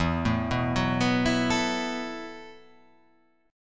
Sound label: E Suspended 2nd Suspended 4th E, down; arpeggiated; JGuitar